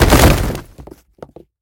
moshnij reshitelnij udar s grohotom

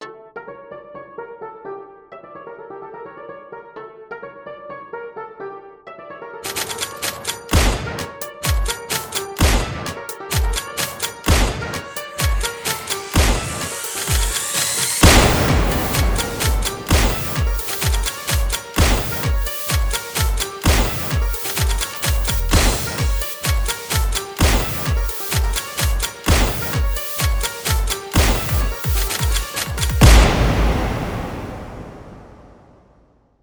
bistraya ruchnaya migayushaya karta vibrato guchzhen melodiya zvuk pistoleta korotkij

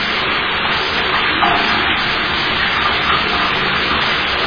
vodopad shumnii dalekii priglushennii

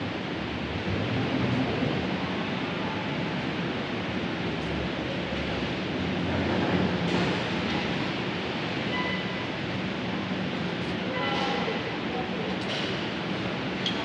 korotkaya audiozapis vhoda v supermarket vozle eskalatorov. obshaya atmosfera

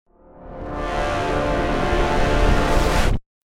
energeticheskie volni generiruyut obratnie zvukovie effekti

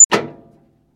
skripuchij tonkij zvuk metallicheskoj shekoldi

